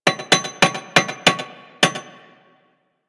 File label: Village Hammering One Off A; Warframe; Ambience; Eidolon Plains; Ostron Village